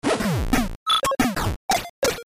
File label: 8 bits sound